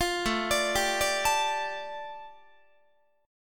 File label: A 7th Suspended 4th Sharp 5th A, Guitar; A7sus4#5 arpeggiated